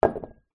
household glass drinking empty down on stone table